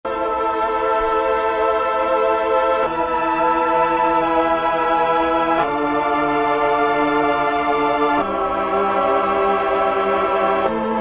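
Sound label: Tron Strings + Vox; PAD-Tron Str+Vox; Roland L-CDX-02 Keyboard Instruments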